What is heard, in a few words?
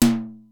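Simmons SDS-9 high tom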